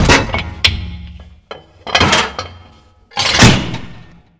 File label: ac130 105 canon reload